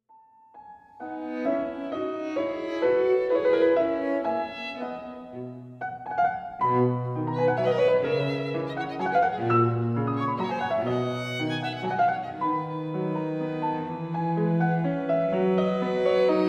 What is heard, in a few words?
piano trio; vienna